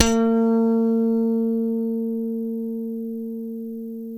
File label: FUNK, Bass; Spectrasonics; Bass Legends